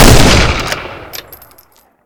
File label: rifle; ML-Agents; FPS Game